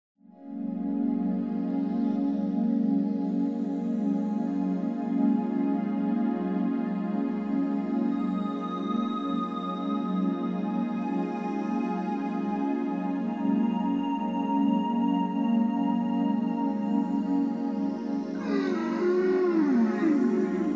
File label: Whale talking